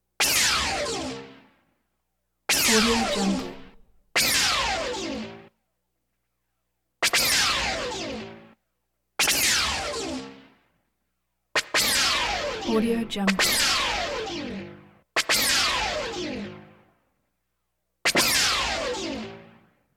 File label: scifi weapon